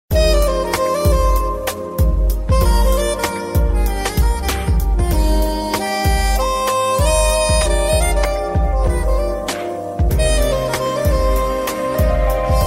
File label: romantic hindi instrumental song tone; bollywood